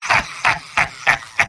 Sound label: sniper laugh evil; vo; tf2; mvm